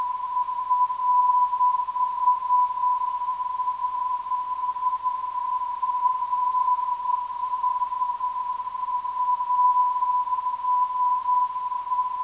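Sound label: non-directional beacon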